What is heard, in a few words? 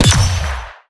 skill dark gun hit